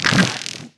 winged scarab hit; DAoC

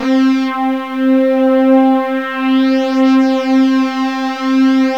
polysynth, synth pad; gmtones

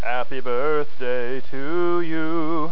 Happy Birthday to You!; blastdoor

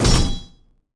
sword

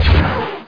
FUSION

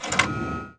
mouse multi; Bombardier

